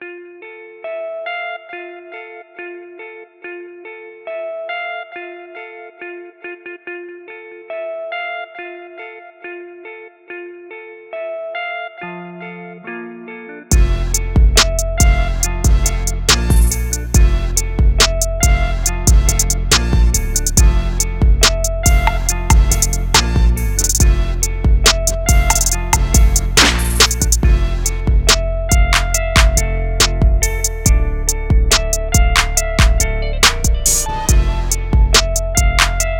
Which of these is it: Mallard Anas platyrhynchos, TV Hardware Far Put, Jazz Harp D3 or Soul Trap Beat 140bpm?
Soul Trap Beat 140bpm